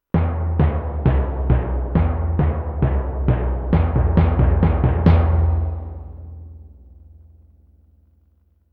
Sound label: timpani main melody